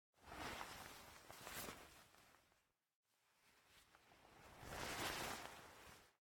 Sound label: Move Screamer; Game rip; MiSide